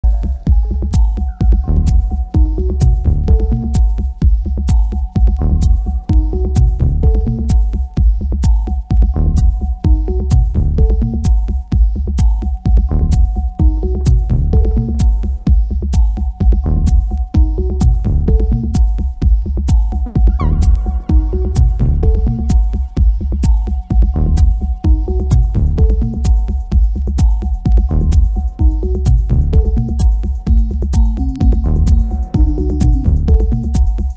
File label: techno